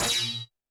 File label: Roland TD-20 Percussion